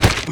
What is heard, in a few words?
pain, player